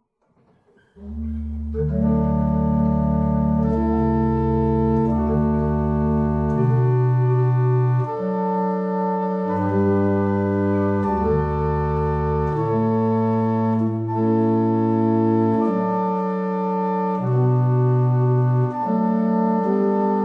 barrel organs; Staunton

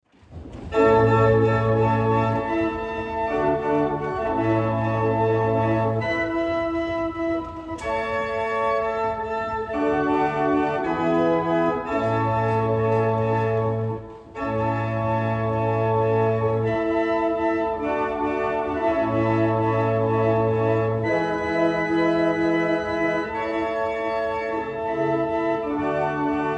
Single chant, barrel organ; Norton

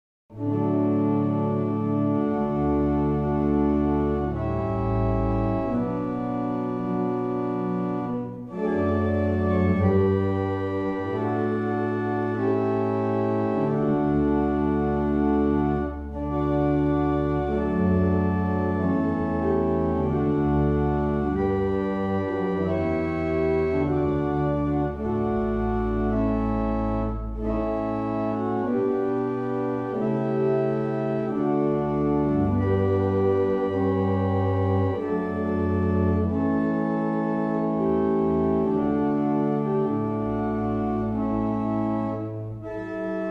Manchester, barrel organs; Meldreth

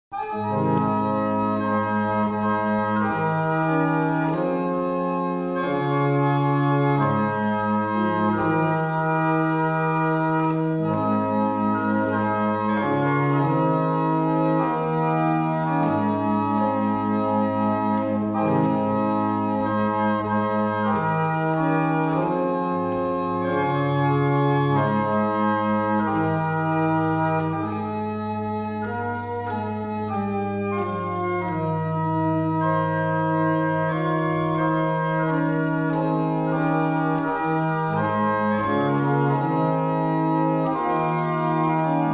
barrel organs; Tytherley; Cambridge New

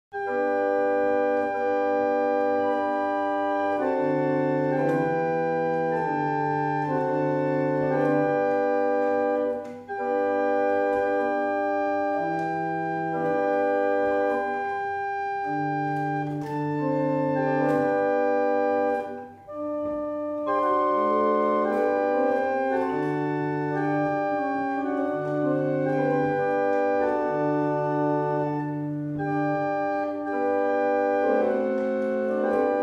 Hymn, barrel organs; Winwick; Luthers Hymn

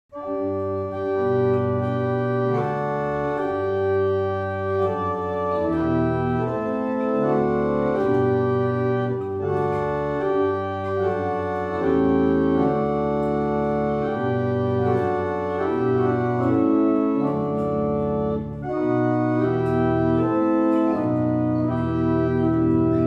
barrel organs; Brightwell

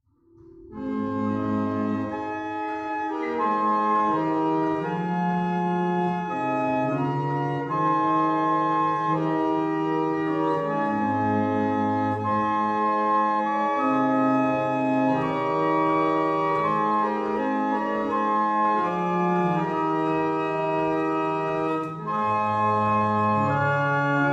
barrel organs

